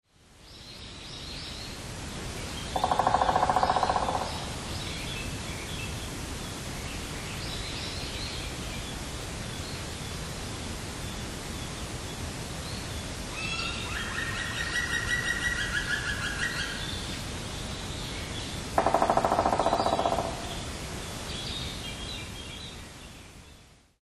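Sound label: Black Woodpecker; kumagera; tomakomai; koe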